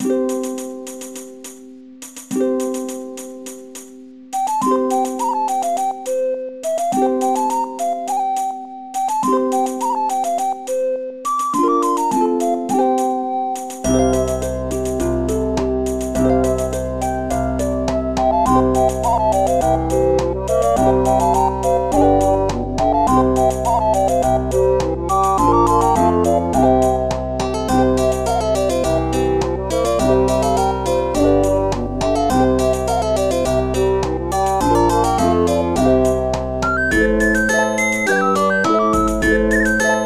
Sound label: Country Living; project exile prototype; gba